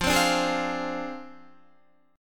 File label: F Minor 6th F down Guitar; strummed; tuning 4E,4A,5D,5G,5C,6F